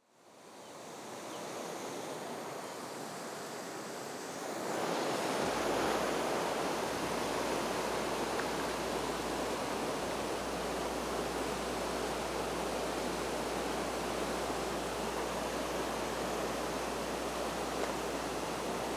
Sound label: Keshena Falls from Bottom; Menominee PowWow 4 Aug 2023; Menominee Homecoming Powwow August 2023